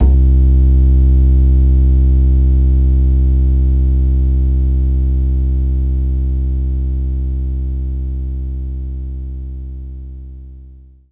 acoustic bass C2

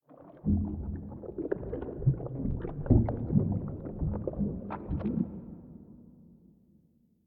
Creature; We Are the Dwarves

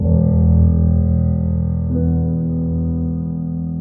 Pre-boss (189bpm)

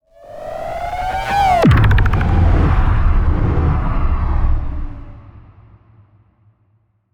Low Warped

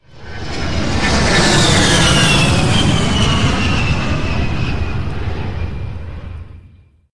A10 Flyby; Wave 2; Criminality

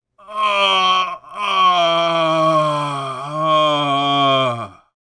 Grunt

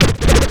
DJ Disco Scratch 1 beat; Plants vs. Zombies Battle for Neighborville

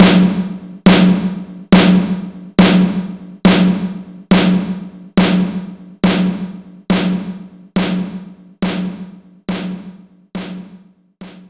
Power Snare

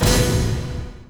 Final Battle - Combo Stab

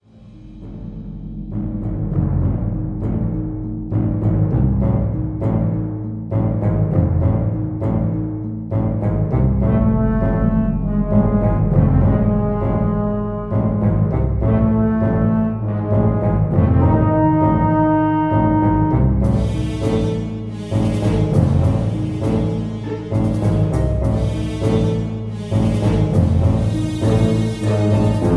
Jingle Doctors Theme Light; Jack Keane

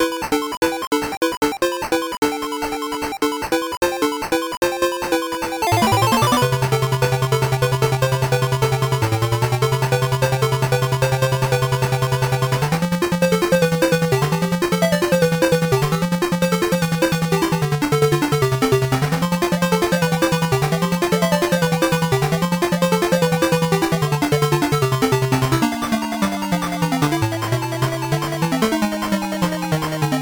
8-Bit Version Fast; Sonic the Hedgehog FM; Sega Master System

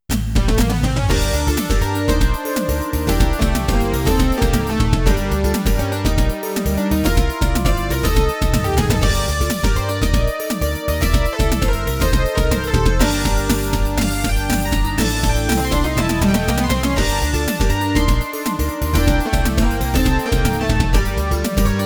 KICK; Crystal Rinal; PC-9801

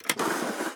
case Remove Non Metal

